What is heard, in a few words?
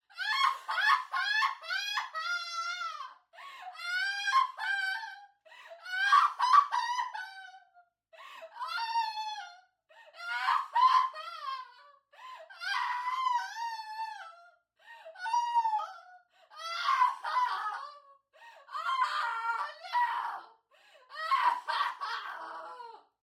Scream Weeping Widow, Crying, Heartbreak, Perspective; 344 Audio